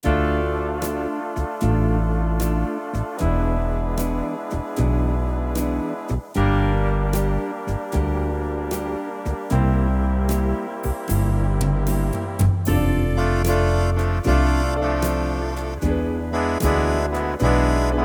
style swing and jazz moonlight ballad, yamaha tyros3